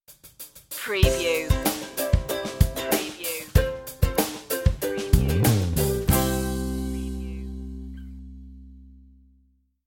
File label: put a smile on stinger